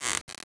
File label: water pistol draw; cstrike